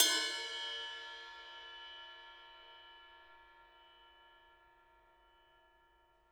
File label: RIDE, RIDE CYMBALS; Spectrasonics - Backbeat